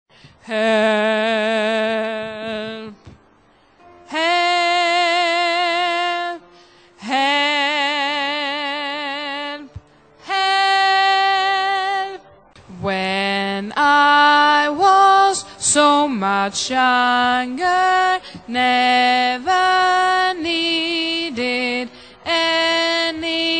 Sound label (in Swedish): bas